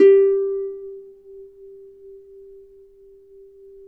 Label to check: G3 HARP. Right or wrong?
Right